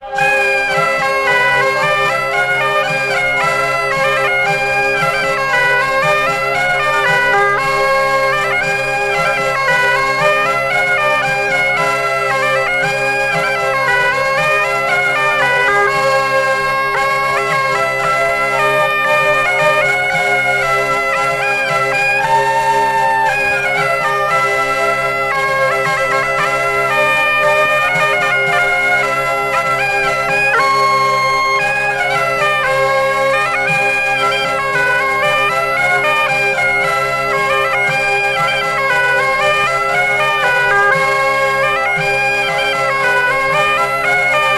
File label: alto; Branle de Cosnay; Altogether Nows & French Day Course - 13th September 1980; SIFD